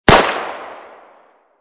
Gunshot Shotgun

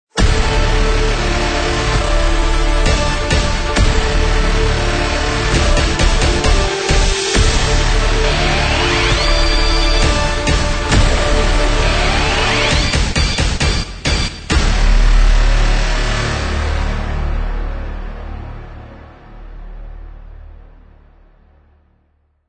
Epic

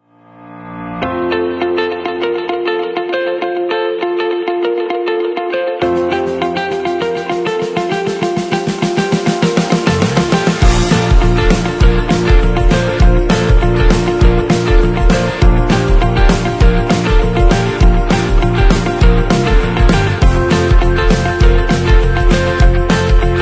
My Adventure Travel Short

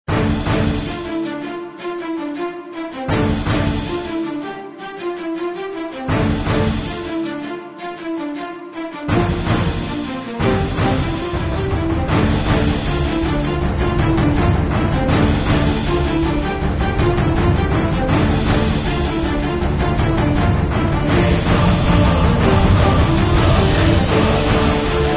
Dramatic Movie Trailer; 电影预告片战争包 Movie Trailer War Pack

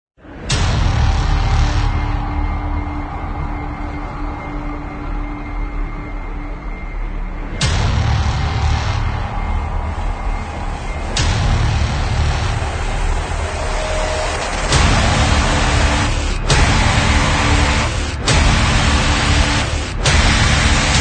Epic Trailer Intro Ident

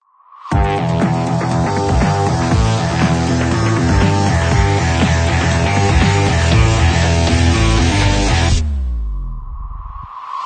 Extreme Breakbeat

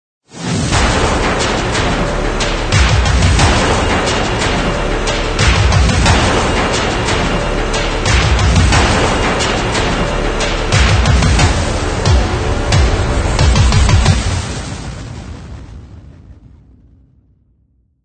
Epic Trailer Logo Intro

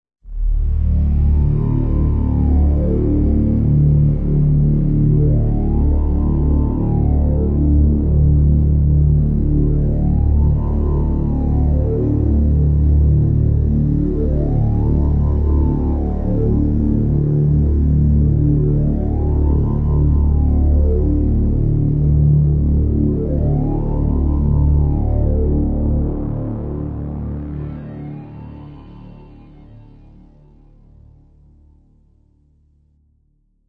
Wavetrip

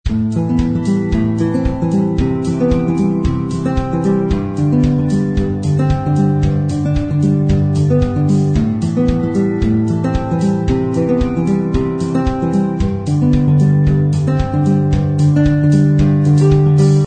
Verse NoPiano; 夏日温馨套装 Warm Summer Kit

